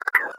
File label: vox idle, creatures, snark